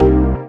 Synth Shot; Vengeance Samples

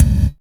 Soft Kick; Vengeance Samples

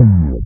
Slides; FX; Vengeance Samples

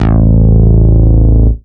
Bass Root A

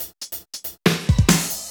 Loops Fills; Vengeance Samples

